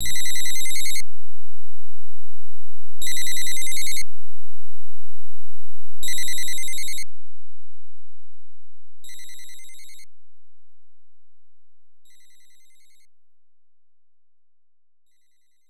Telephone Ringing; Maniac Mansion; Family Computer; Intermission 3

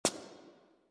Cutie Stomp

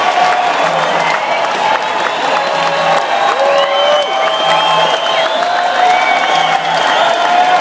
Ui Crowd cheer

